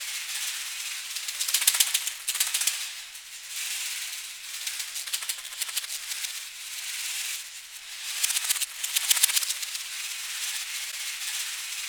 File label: ST PER A, SHAKER FX; Spectrasonics; Heart of Africa 1